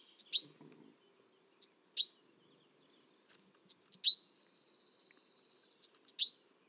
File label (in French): dans le tilleul